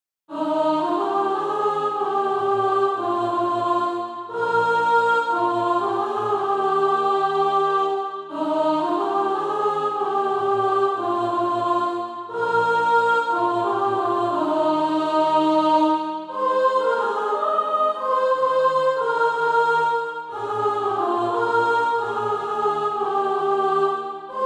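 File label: sop, Practise Music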